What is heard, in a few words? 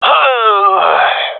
death; Counter Strike; CS:GO; seal diver